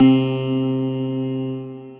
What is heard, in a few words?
Lucky Chimes; Ableton; Retro Synths; P-50 Linear; P-50 Structures